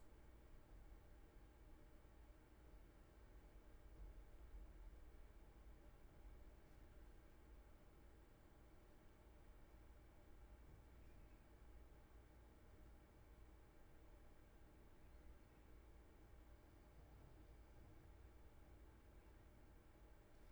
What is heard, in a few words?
roomtone